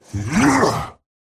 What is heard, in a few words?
effort melee small; Slork